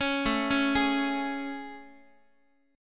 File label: A Major 7th A, Guitar; AM7; arpeggiated; x,x,4,7,5,7